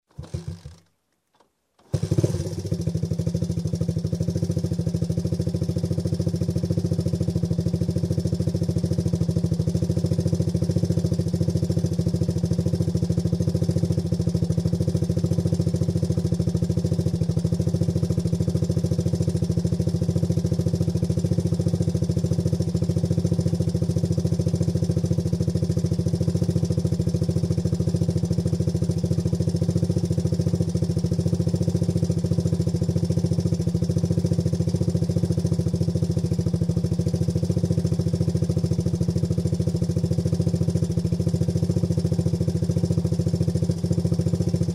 Tricycle starts, waits, turns off; motorcycle

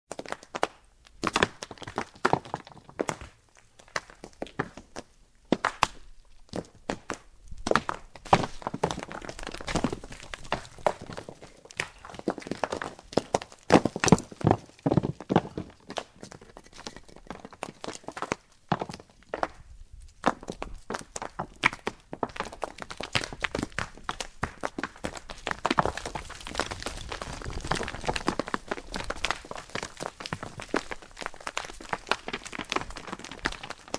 stone rolls down

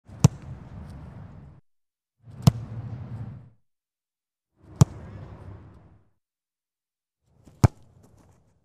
Football ball kick

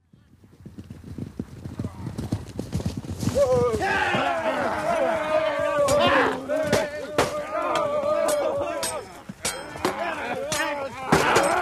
Big crowd sword fighting, people screaming; cold weapons

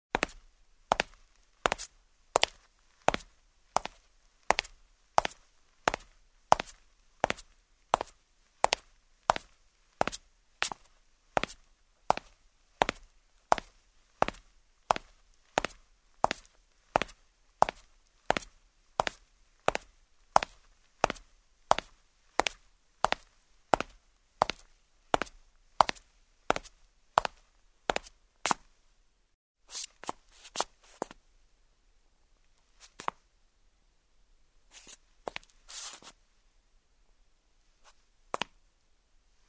Steps on the marble floor in shoes

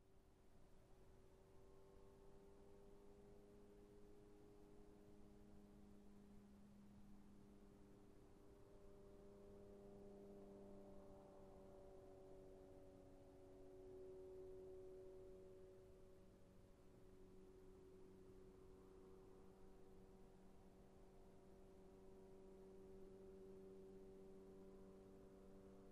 Motorcycle approaching, turning off

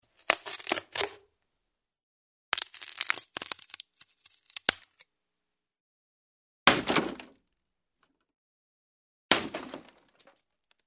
Board breaks; wood

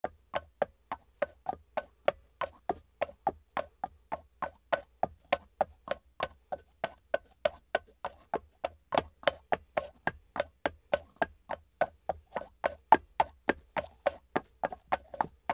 horse walks on a wooden floor

